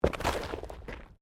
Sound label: Body movement Impact Roll Gravel; Body movement foley to simulate falls and deaths; Rolling; Objects